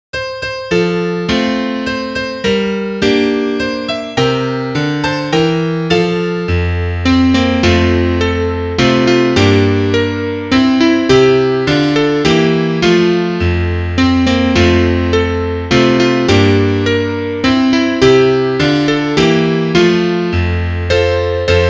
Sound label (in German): Klavier; hab mein wage vollgelade